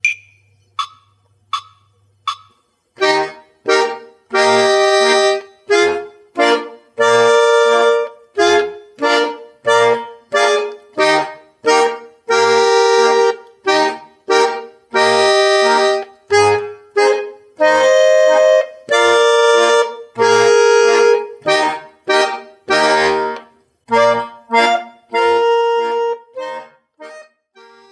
duo party melodie begleitung

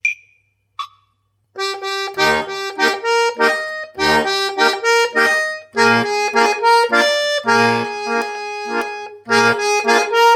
Walzer

